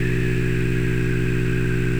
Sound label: low Tone; Metareal; MetarealAudioEditor